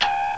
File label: Player Joins